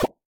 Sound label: Musical Square; FGUK